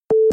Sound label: close